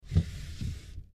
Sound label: MedicalScientific; Soundfx III